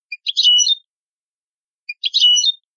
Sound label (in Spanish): quejas simples